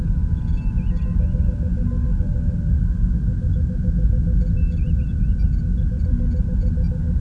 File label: bridge, backgrounds